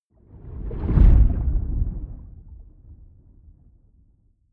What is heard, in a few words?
whoosh abstract low